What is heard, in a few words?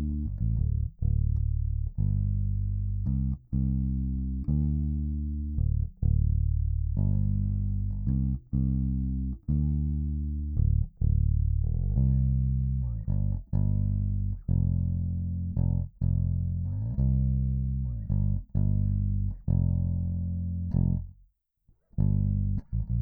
bass; retrocirce/zero shot audio source separation